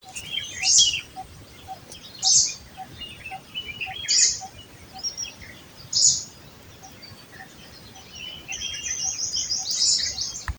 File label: Greenish Warbler Phylloscopus trochiloides, Ladakh; Greenish Leaf Warbler; Ladakhi name: Tsildir